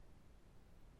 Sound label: noise